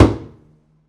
pizz noise body slap, double bass; dsmolken; noises

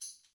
tambourine up; Idiophones; Struck Idiophones; Tambourine 3

